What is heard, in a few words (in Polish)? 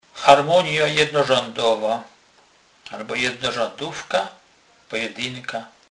harmonja